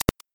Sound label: arrow shot; ludumdare; ld31